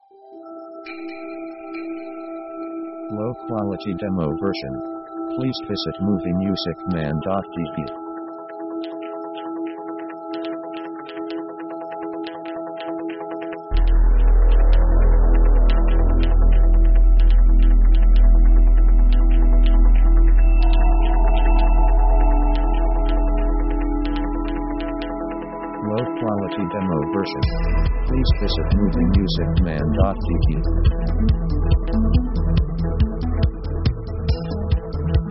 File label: Action 140; synth themes